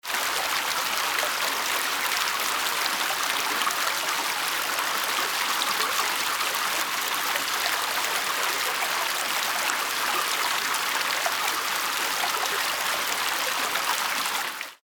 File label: water flowing